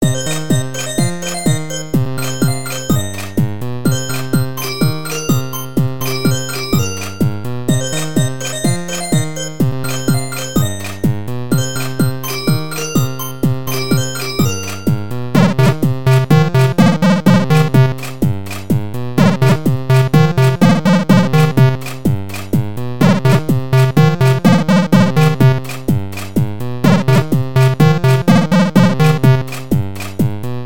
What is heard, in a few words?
some kinda beat